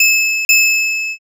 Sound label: bikebell